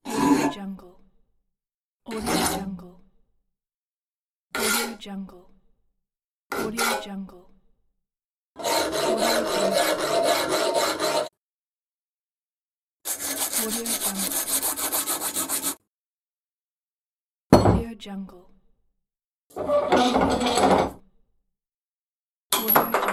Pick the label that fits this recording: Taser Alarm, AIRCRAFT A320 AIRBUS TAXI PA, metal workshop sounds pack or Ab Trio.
metal workshop sounds pack